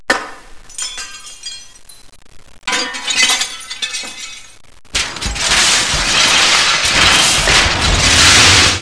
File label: dishes break